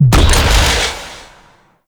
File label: Charged Shot; Weapons; Warframe; Tenno; Wraith Sidearm; Epitaph Prime